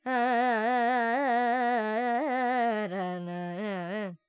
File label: Dorian, Vocals Carnatic Female Alaps 70 G Dry; Sample Banks